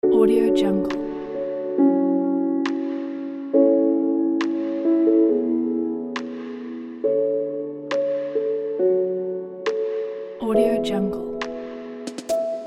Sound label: the chill